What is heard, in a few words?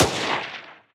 SMG single Shot